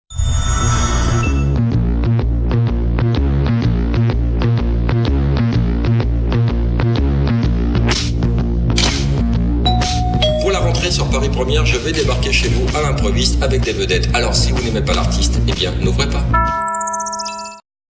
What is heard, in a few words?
promo ding dong; paris1ere